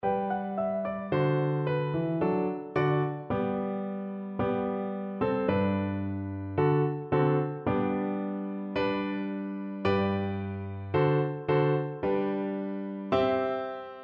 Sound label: diddle diddle, Viola